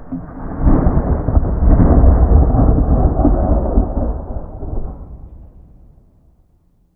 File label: Amb Weather; Red Orchestra Ostfront 41-45